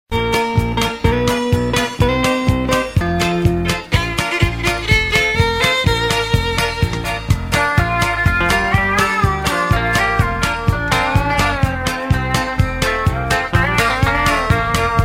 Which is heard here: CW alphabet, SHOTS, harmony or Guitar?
harmony